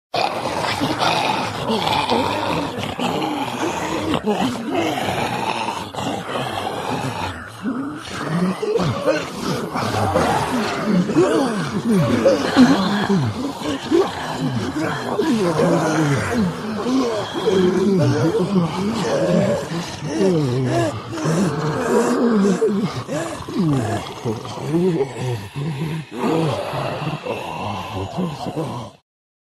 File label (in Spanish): horda de zombies